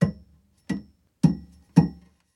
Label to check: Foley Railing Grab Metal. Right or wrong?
Right